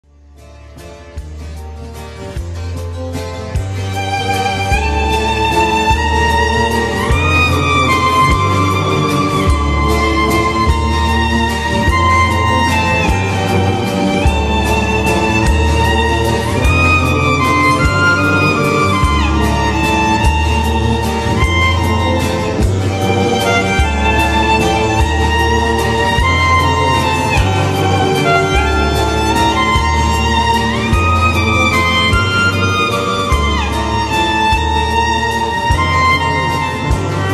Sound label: VIOLIN COVER